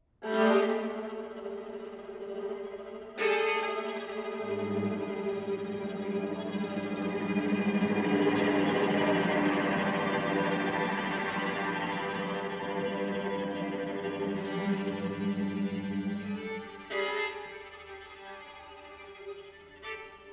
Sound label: Quartet 1st mov beginning